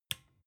desk lamp on soft

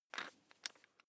electric drill bit fasten wrench turn fast

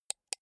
computer mouse right click soft

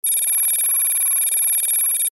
title running layer signal

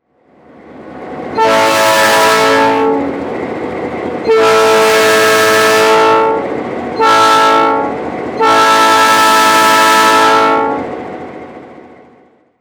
train horn from usa

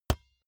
basketball single hand catch hard

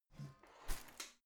garbage can close lid soft

